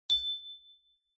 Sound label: hint bell